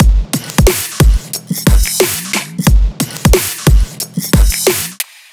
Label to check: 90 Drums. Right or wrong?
Right